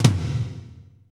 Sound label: tom, Power Ballad; Northstar; Drumscapes Roland